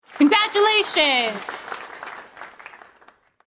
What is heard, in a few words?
Congratulations; DailyBonus; WheelBonus